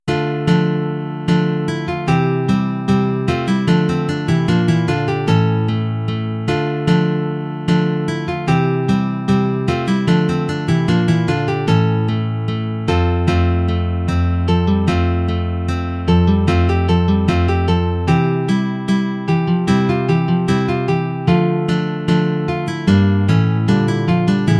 Bourree II (4 Gitarren)

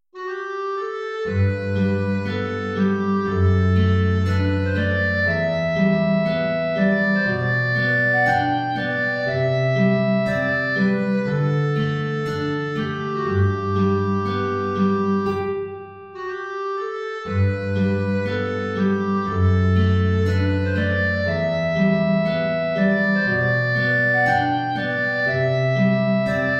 Klarinette in Bb Gitarre hoch; Down by the Salley Gardens

